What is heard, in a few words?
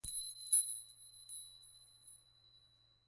wind chime g